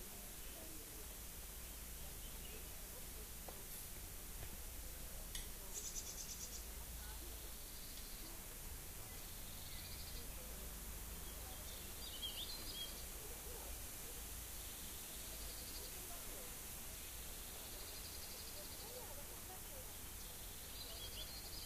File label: rayol farm; SEAMED